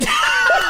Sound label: laugh track; Snapcube Sonic Adventure